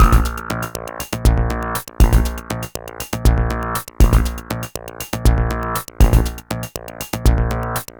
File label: Beat, Drums & Percussion; Electrocution; Beats